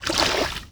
water walk medium

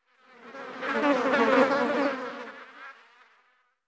insect fly; ModernWarfare

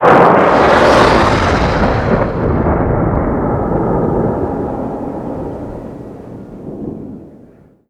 abrams 120mm tail; weap abrams120mm tail

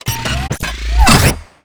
biomech counter

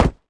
grass run

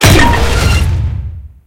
tank hit big unpierce

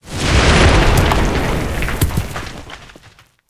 tanks explosion ground; War Thunder

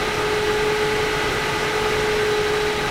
centurion engine rpm 66, engines tanks; War Thunder

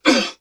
pain grunt, Human Pilots; Titanfall 2

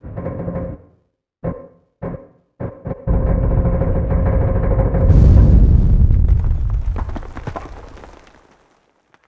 office occasional

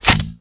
arm pull

